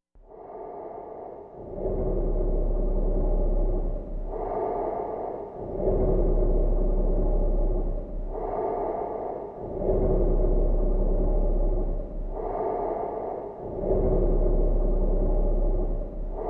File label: Human Breath Through Reverb, Bassy Exhale For Ghostly Presence; ghosts & demons